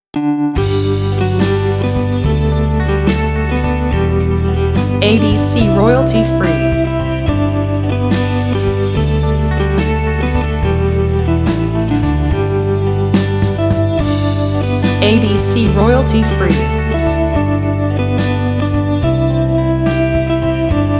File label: Auld Lang Syne